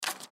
Train Ticket Tear; Transportation; Train Sound Effects; Trains (Sound Effects:Vehicles); Blastwave

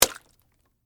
Impact Egg Drop; Texture; Vegetable; Drop Sound Effects; Objects

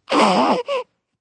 Baby Groan, Newborn baby sound; Babies; Voice

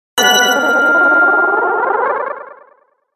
Logo Crystal Shimmer Reverb; Sonic Logo; Production Element Imaging Element Accent Transition; Blastwave

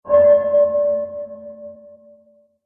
Horror Bell Accent; Bell Sound Effects; musical

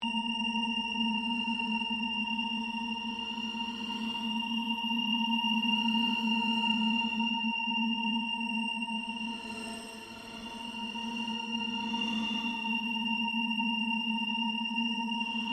drone, Various sweeps, pulses and drones; Drone Sound Effects; Sound Design

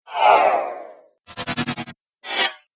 Whoosh Trio Pack; Andrew Fleming

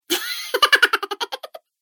Chuckles Cartoon Game Voice; Laughter Sound Effects

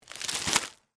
Ramen Noodles Bag, Set, Down, Plastic; Household; Food

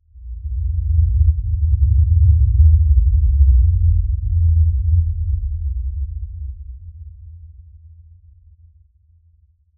Impact Lfe Rumble; Impact Lfe Rumble Pass By Bass Low; Low Impacts (Sound Effects:Impacts); Blastwave

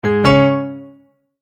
multimedia tone verified; Sound Design; McKinney Sound

